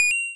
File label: coin, square waveform; Square Waveform sfx